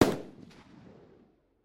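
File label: accidental blank gunshot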